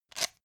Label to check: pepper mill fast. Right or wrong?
Right